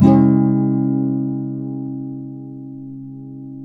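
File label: nylon guitar down minor D, flam chords; Spectrasonics; Hans Zimmer Guitars VOL-2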